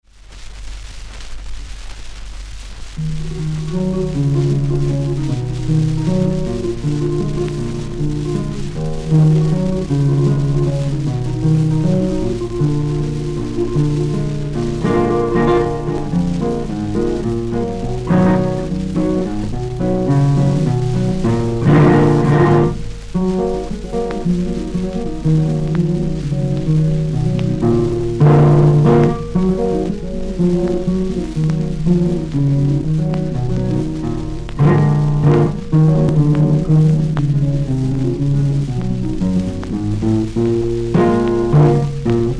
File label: Flamenco, 78rpm